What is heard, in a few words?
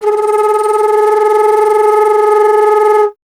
Alto Flute, Solo Instruments